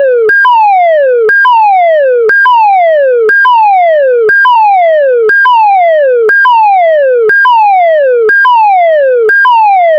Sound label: fire alarm; warning